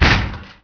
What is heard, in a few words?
Wire impact